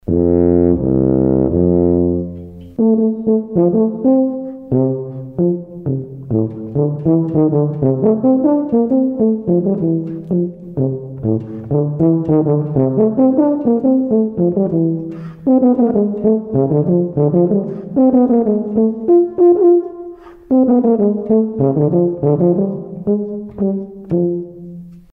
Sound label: tuba